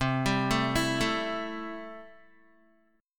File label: C 6th, Guitar; arpeggiated; 8,10,7,9,9,x